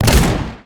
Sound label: shotgun double